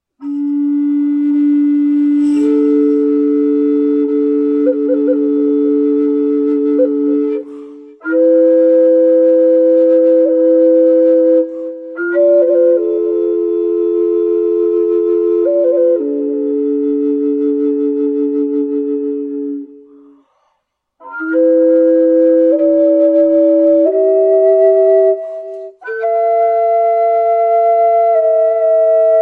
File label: clay flute